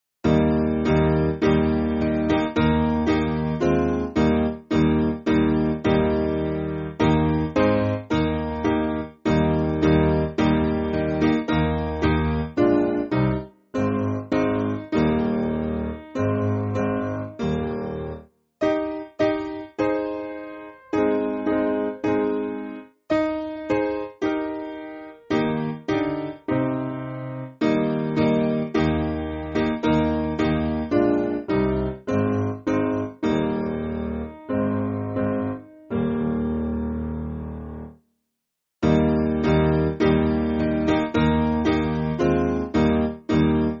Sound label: Spiritual, Piano; 4/Eb; Lord I Want To Be